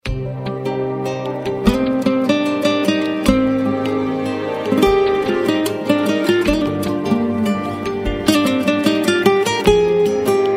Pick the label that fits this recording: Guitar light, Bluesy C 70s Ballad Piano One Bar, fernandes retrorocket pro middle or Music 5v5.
Guitar light